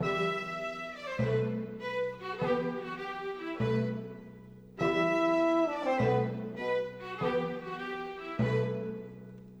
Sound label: Orchestra; Sonic Foundry (Sony Creative Software); Orchestral VOL-2; Modern